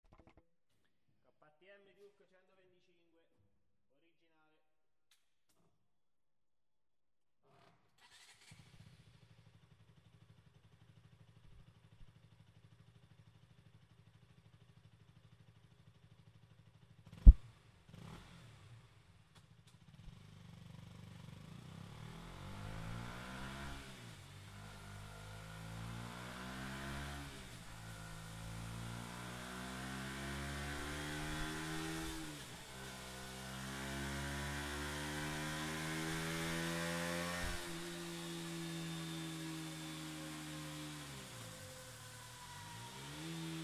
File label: KTM Duke 125 stock, exhausts